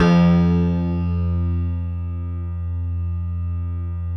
G1, PNO; Northstar - New Gold VOL-1; PLTNM.PNO

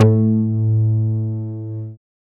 fat bass; Dance; BASS SOUNDS; Masterbits; Dance Trax

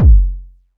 Kick, Drum Hits

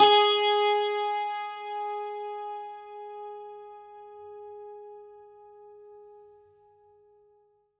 santoor g#3; World Instruments 2; STRINGED INSTRUMENTS; PS SANTOOR SABERI

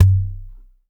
SND WDS L, DRM HITS; Spectrasonics; Liquid Grooves; WAVDRM HITS1

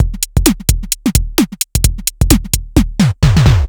Synth Loop, BPM130

